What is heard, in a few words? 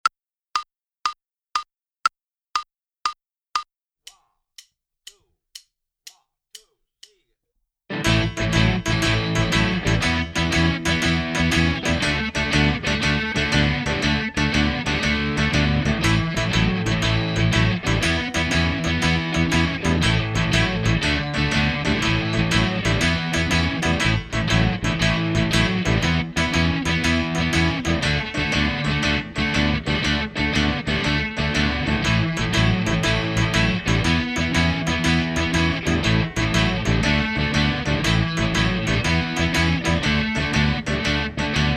Minus Drums; Inst Ensemble